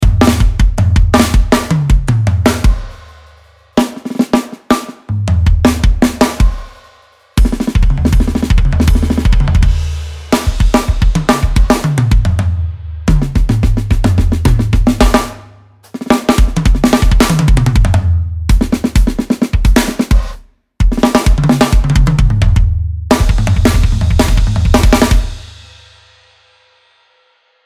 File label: hip hop gospel fills; addictive drums 2 hip hop and gospel collection; midipak